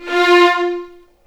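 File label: F4 violins; The Ultimate String Collection; East West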